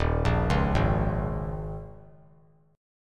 D sharp 7th Suspended 4th; D#7sus4 arpeggiated; JGuitar

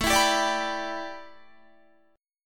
A 7th Suspended 2nd Suspended 4th Guitar

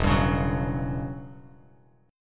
E Minor 6th Add 9th E down Bass Standard

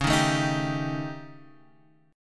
Eb 7th Flat 5th Db

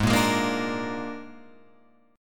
G sharp Suspended 2nd Flat 5th, Guitar Open F; G#sus2b5; strummed; JGuitar

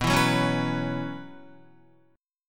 B Suspended 2nd Suspended 4th, Guitar; strummed; JGuitar

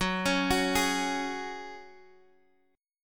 Gb Suspended 2nd, Guitar, Open G; down; chord shape

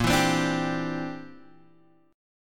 C Major Add 9th, Bb, down, Guitar, Standard; strummed

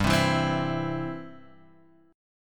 E-Minor Sharp 5th

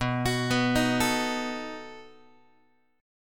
B 7th B down Guitar; B7 arpeggiated; x,7,9,9,7,8; JGuitar

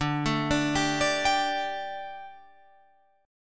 D Suspended 4th D, Guitar, Open F; Dsus4; arpeggiated; 14,16,14,14,17,14

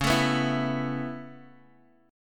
Db Minor 7th Sharp 5th, Bass Standard; strummed

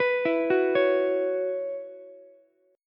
B Suspended 2nd Suspended 4th, Ukulele; Bsus2sus4 arpeggiated; JGuitar

